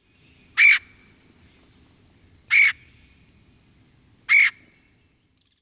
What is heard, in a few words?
Red headed Woodpecker Tchur calls